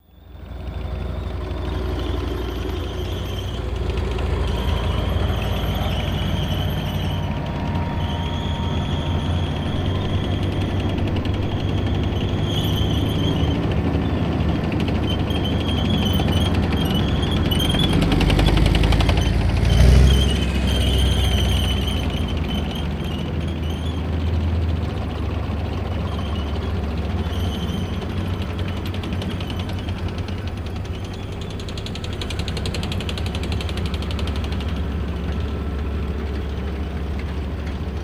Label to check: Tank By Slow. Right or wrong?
Right